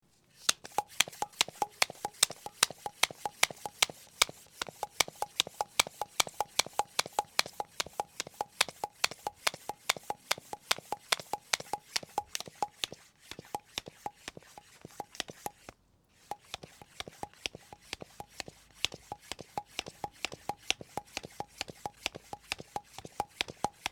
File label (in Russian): velo nasos, velosipeda